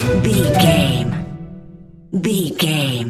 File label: music sting short groovy flute